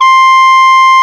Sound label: Bb ff Clar F4